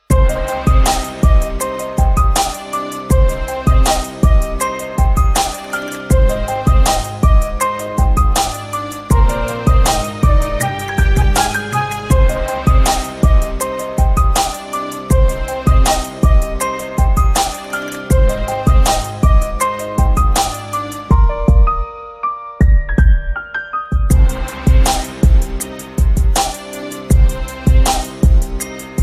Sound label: gangsta beat; hip hop